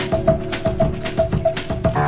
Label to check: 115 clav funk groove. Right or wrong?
Right